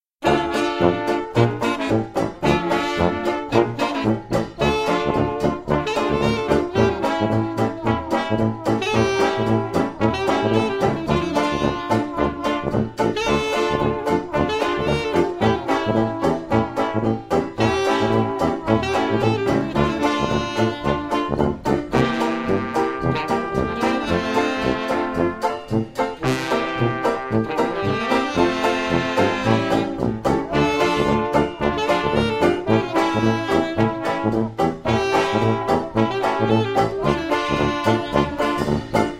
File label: rhumba